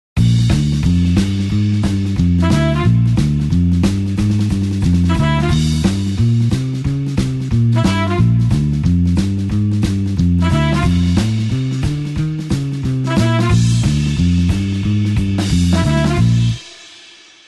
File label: blues horns up Bb